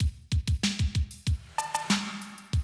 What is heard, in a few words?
Classic DrumLoops